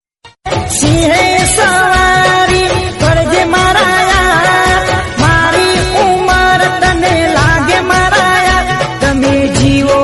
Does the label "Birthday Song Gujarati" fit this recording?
Yes